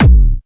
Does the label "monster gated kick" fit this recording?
Yes